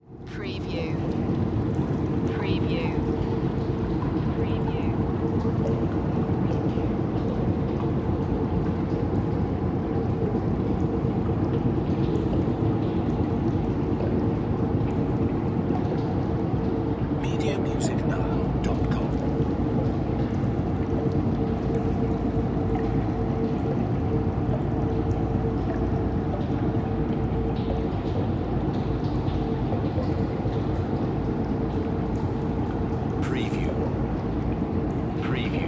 Abandoned Factory; sound effects bible

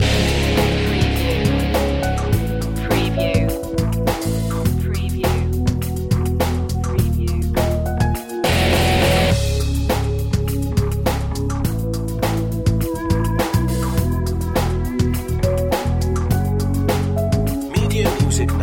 hard fight loop

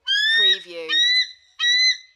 American Bald Eagle squawks 2 times

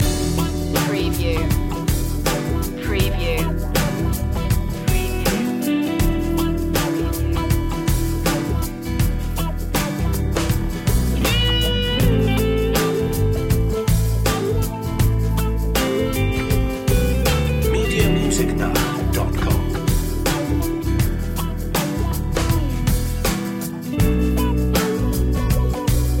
smooth blues loop

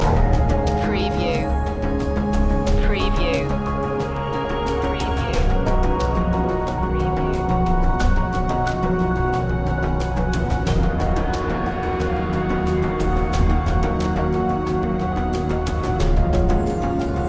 sirene call loop

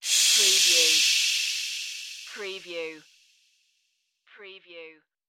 Air Burst Pneumatic; sound effects bible

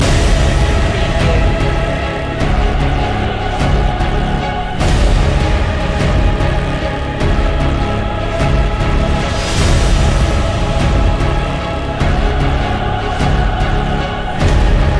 battlefield loop

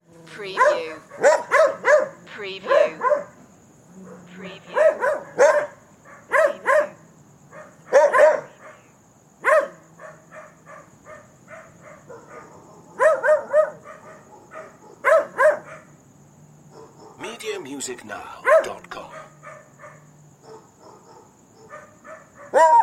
dogs barking at night

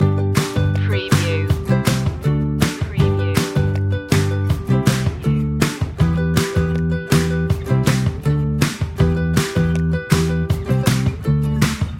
light upbeat loop

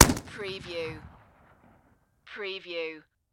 Rifle Howa M1500

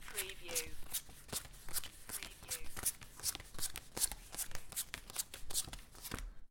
Playing Cards Dealing Many Cards In One Stack

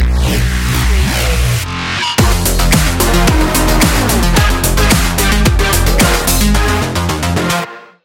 adrenaline stinger

